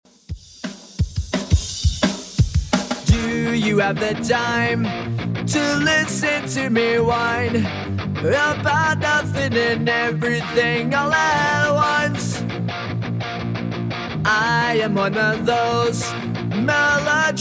90s Rock Redrum 172bpm

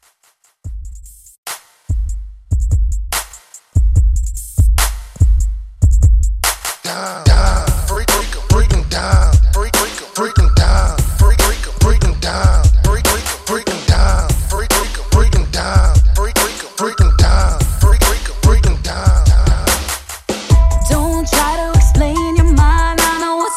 R B Teen Pop Re Drum 145 BPM

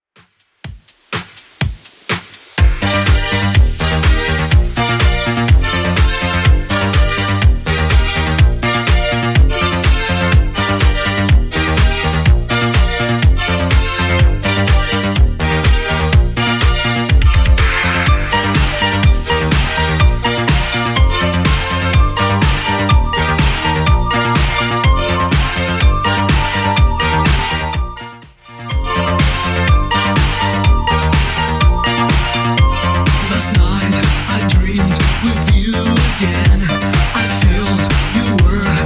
80s Extend Redrum 124bpm

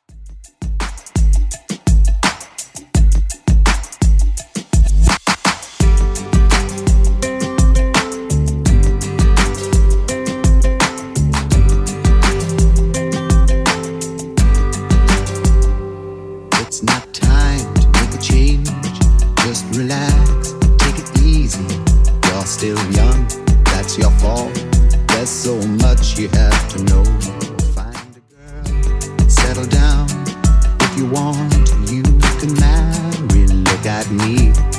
70s Redrum 84bpm

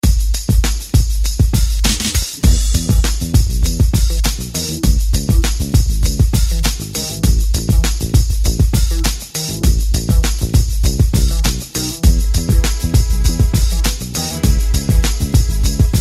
70s redrum

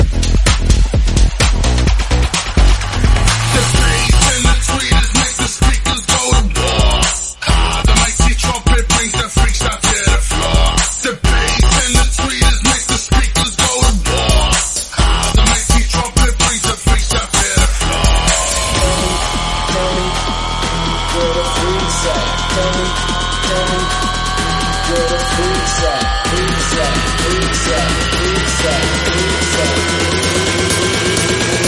Tech House 128bpm Em; Snippet

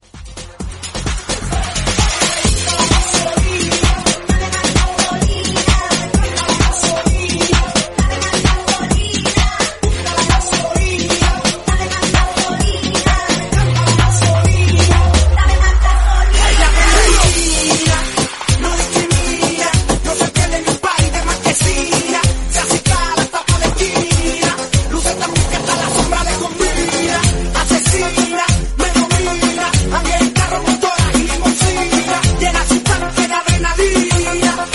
130bpm Fm

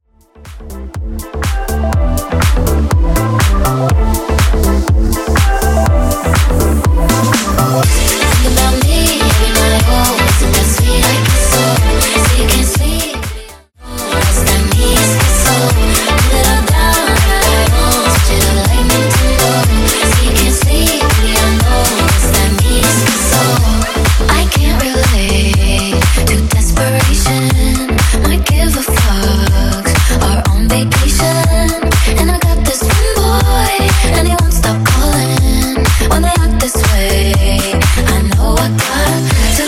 Remix 122bpm

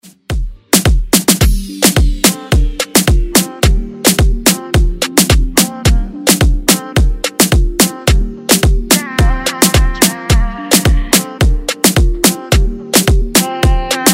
Moombah Redrum

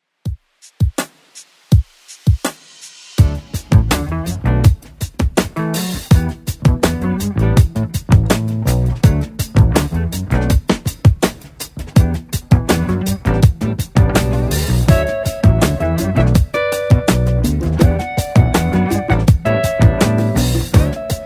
70s Redrum 82bpm

